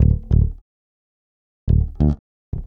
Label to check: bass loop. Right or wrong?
Right